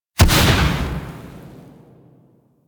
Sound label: Goo Gun Charged Shot